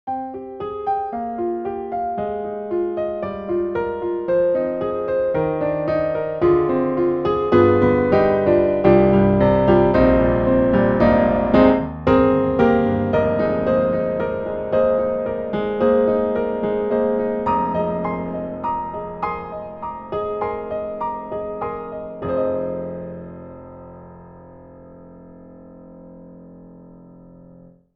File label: Grand Pianos Bosendorfer Mellow; Synthogy; IvoryII; Mellow Bose Tshift